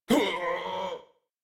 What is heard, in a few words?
Death, en; Warframe; New War; Teshin